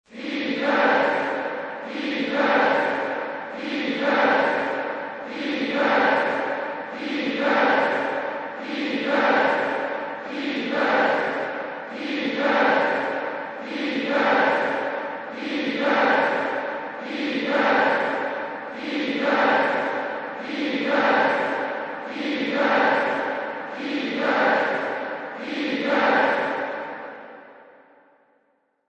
Defense, Basketball Chant Defense. Indoor Sports: Basketball. Chanting; Chanting "Defense" repeatedly; Lynne Music